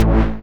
Synth Hit A

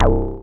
BASSES; Masterbits; Vintage Voltage

